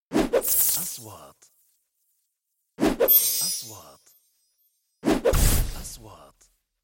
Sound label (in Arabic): نغمة اندفاع هوائي سريع; transitions